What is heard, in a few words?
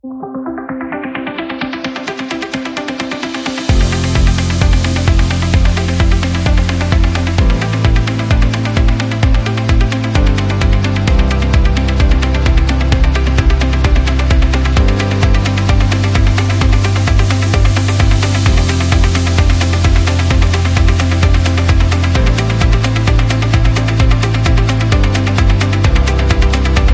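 Winning Moment, motivational corporate music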